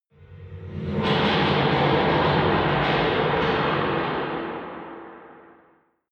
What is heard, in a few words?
metal rumble